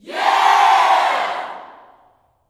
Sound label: "YEAH", Gospel, YELL SHOUT; Spectrasonics; Vocal Planet Vol.1 Gospel; akai